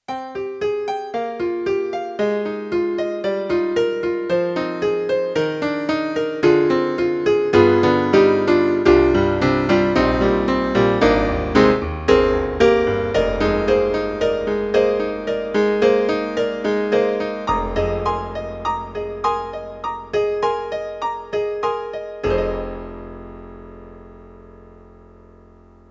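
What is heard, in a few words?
YAMAHA MDP-5 XG, British Piano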